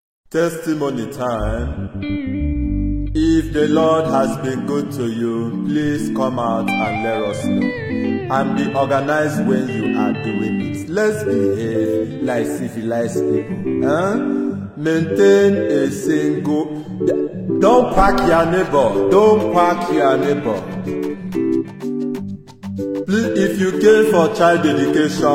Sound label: Oriental Choir; Testimony Time